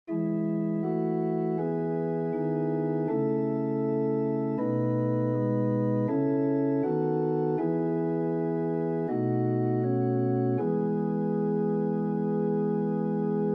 for organ; mendelssohn bartholdy felix; allein gott der hnuh sei ehr from paulus

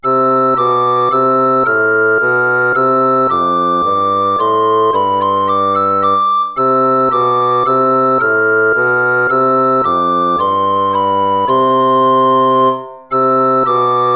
tempo minuetto; hook james

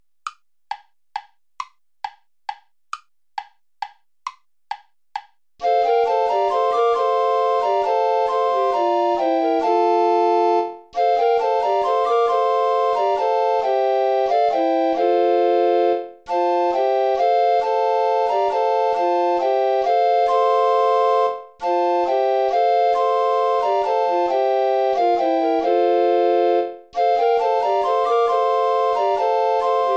volta play along without bass recorder; praetorius michael

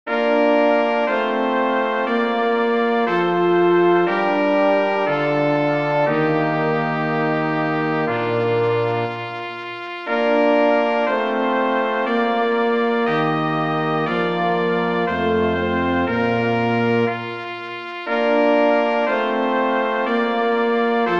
choral for brass quartet; bach johann sebastian; wird der nder nde

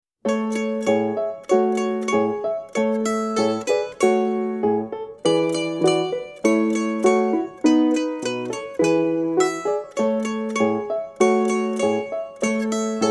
jingle bells; pierpont james

